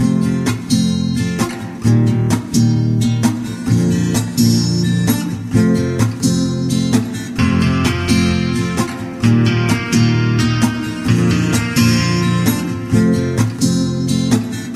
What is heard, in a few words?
summer day guitar